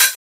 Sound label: muffled hi hat one shot g key